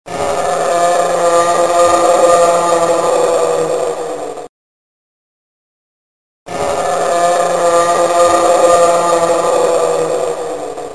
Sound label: corpse moan